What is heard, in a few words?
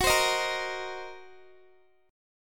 Gb 6th Flat 5th Gb down Guitar; Listen to Gb6b5 strummed